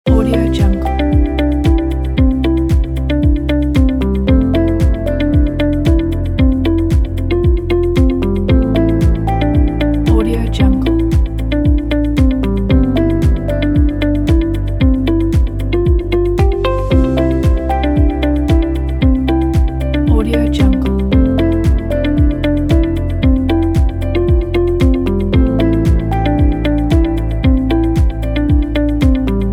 corporate loops pack

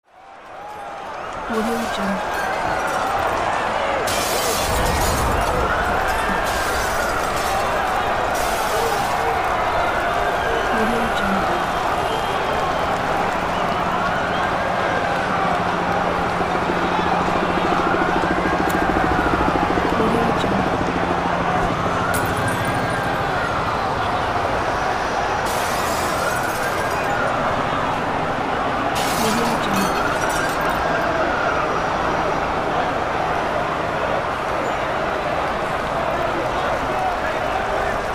street riot ambience

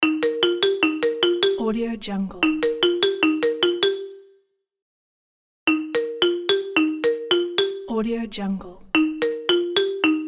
marimba ringtone

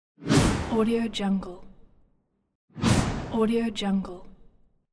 low swoosh

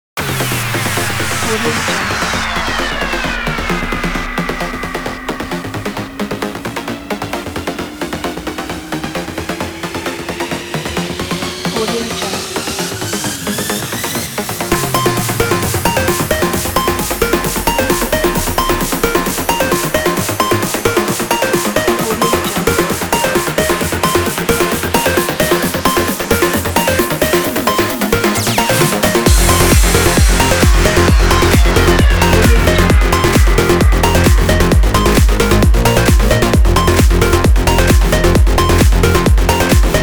technology techno kit

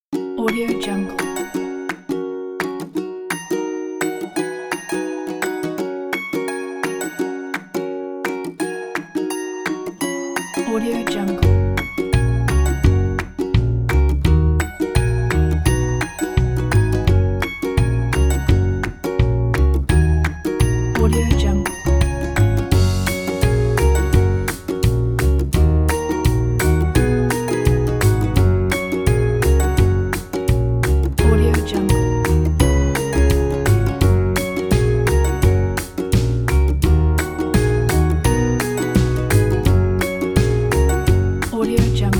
good time; sound background

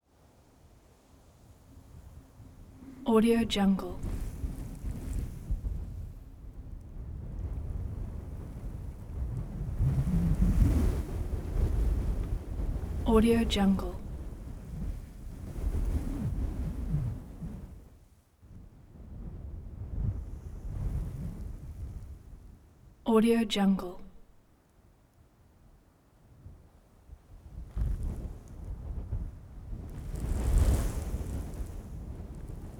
wind strong various gusts

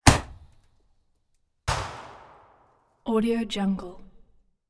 1 inch mortar cabin reverb

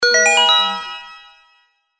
quiz correct sound

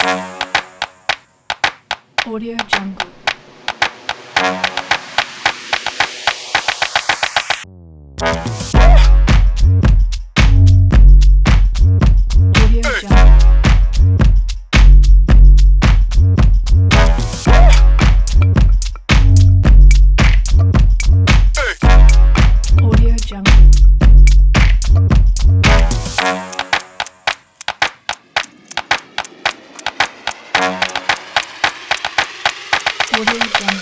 the rap

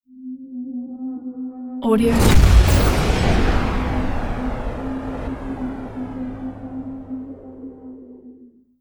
cinematic impact

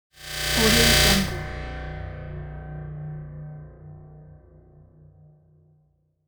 trailer transition; kipaloops

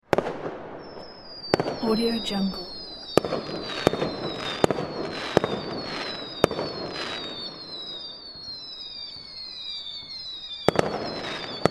fireworks rocket whistle with explosion

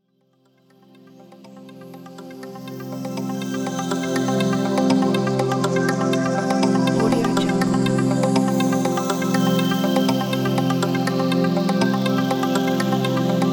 futuristic game show countdown

